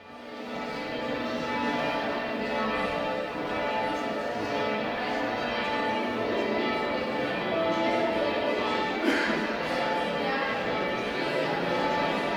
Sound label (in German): Glocken